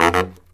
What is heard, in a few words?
BARYT, Killer Brass Riffs; USB Soundscan vol.29 - Killer Brass Riffs; AKAI